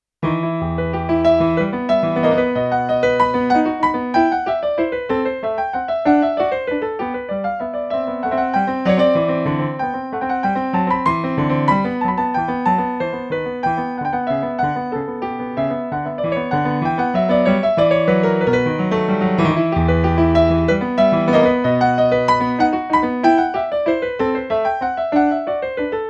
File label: bach prelude in e minor; bwv 938